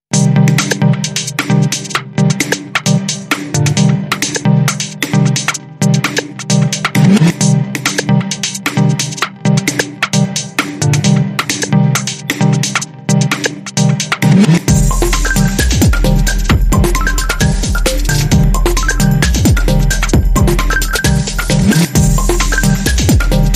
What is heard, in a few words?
Melodic